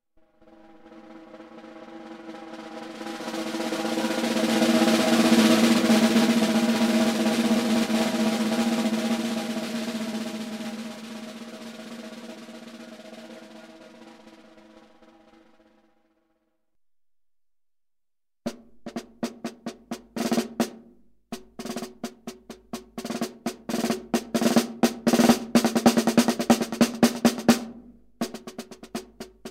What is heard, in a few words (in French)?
caisse claire